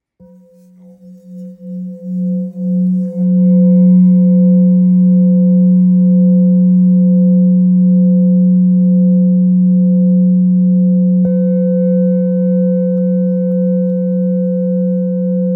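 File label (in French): Bol therapeute do, bols chantants tibétains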